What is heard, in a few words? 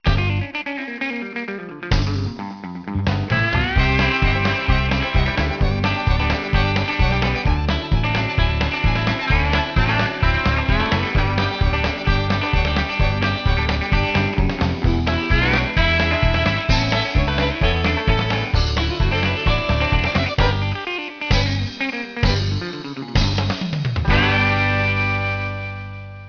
country